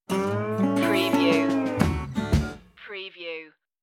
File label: barbed wire blues stinger